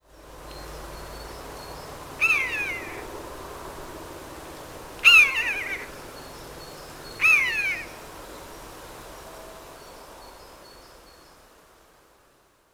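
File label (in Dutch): Buizerd Buteo buteo, fluiten; vogels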